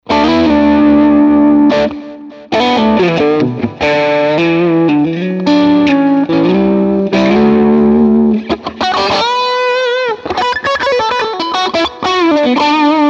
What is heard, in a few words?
Matchless